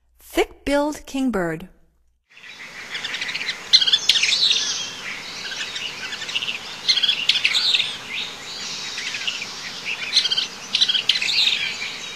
Thick-billed Kingbird, Songs of Birds of North America; Stokes